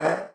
Bark

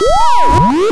robot

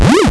Hop

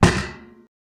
wood and metal

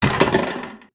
Misc metal

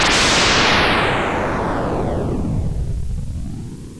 Lightning

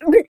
weird

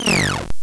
Laser

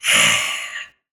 Monster

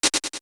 Kick

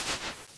Sand

